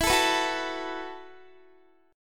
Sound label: E Suspended 2nd Suspended 4th E, down, Guitar; Esus2sus4; strummed